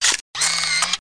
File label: Polaroid; TKKG 4